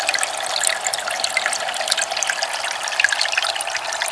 water

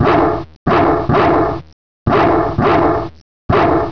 dog bark

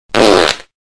fart

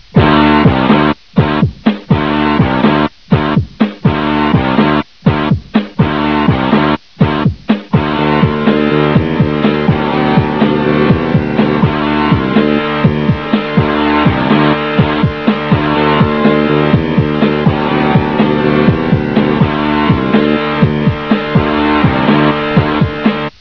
downtown rock

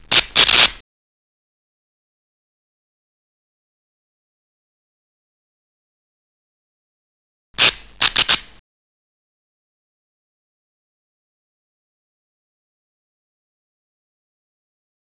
sparks

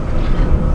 fan

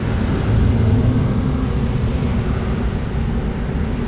air

